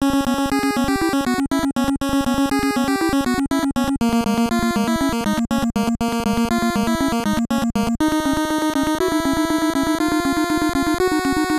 Level Theme; Orb•3D; NES

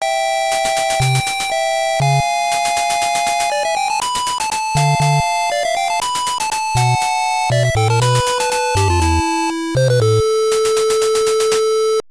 Game Over; Vegas Dream; NES

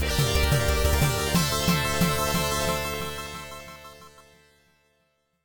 Game Over; Thunder Force 2

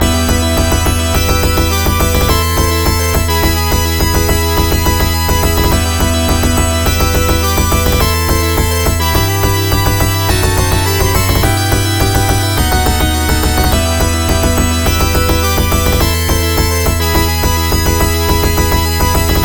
Battle Normal; Phantasy Star 3

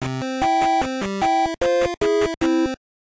Game Over; Pac-Mania; NES

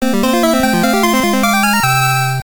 Stage Clear, NES; Little Nemo

